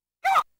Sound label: normal punch; RedDot